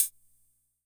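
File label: closed hi-hat, rhythm 55; classic machines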